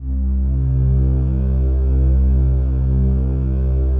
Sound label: PAD, DIGI-PAD